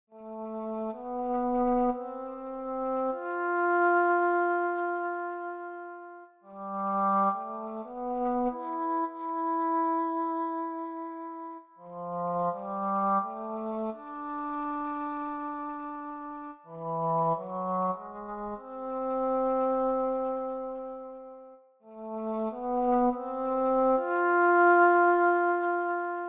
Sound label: tenor